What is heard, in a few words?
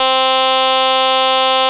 clav, SQ80